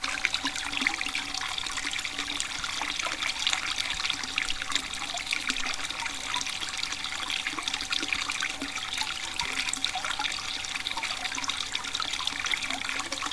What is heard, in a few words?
water stream